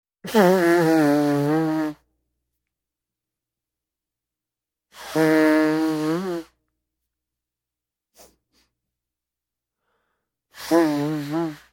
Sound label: Nose Blowing on a handkerchief